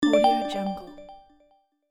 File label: app notification sound